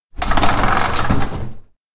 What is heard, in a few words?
door open rattle; anemoi